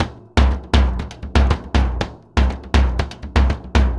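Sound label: BOMBO SALSA, salsa drums and percussion; Best Service ProSamples vol.14; AKAI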